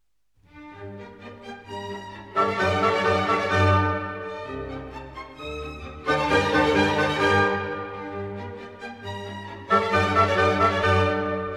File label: Symphony No. 40 in G minor fourth movement; Mozart; Legend of Galactic Heroes; Galactic Empire SIDE